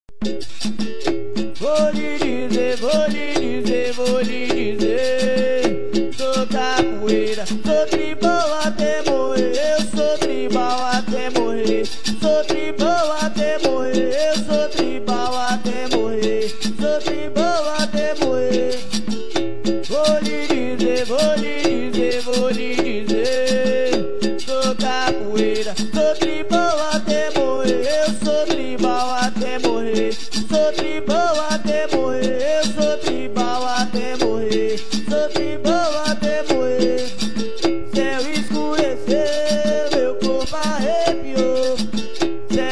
capoeira tribal

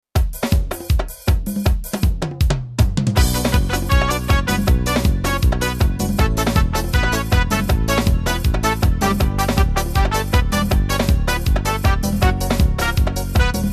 ritmos yamaha axe acelerado

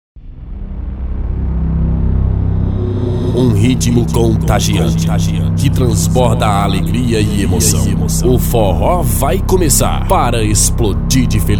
vinheta abertura

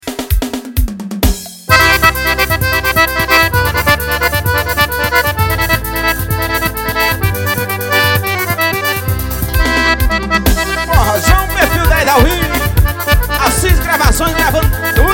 forrozao solo iv paraiba

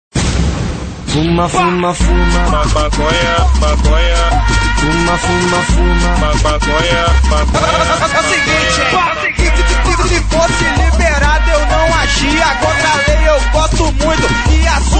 funk batidas

